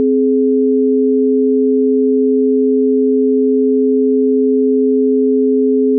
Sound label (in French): sine